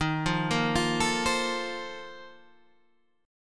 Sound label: Bb Major 7th Suspended 2nd Suspended 4th